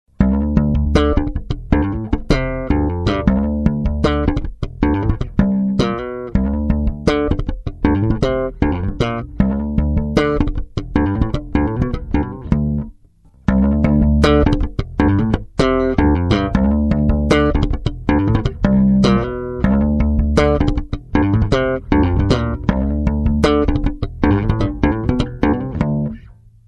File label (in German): kompressor; ak-workshops